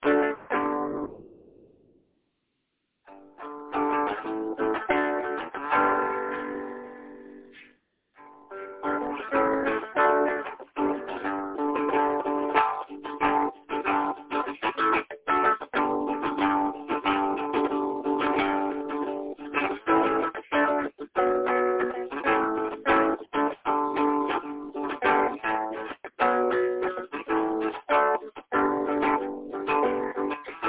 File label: indie ish hangs out on 4 chord